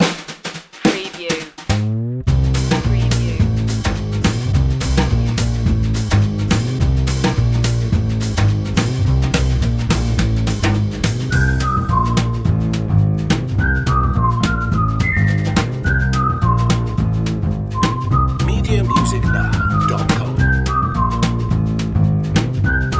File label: magic whistle